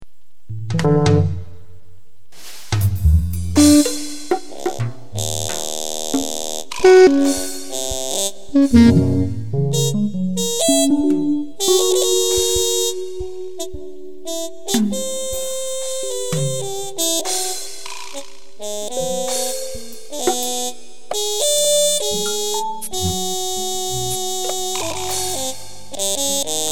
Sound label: jazz band; bosch's